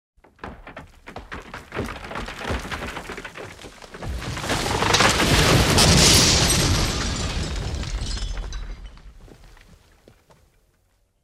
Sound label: Tree falls on the house; wood